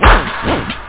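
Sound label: snare drum + scratch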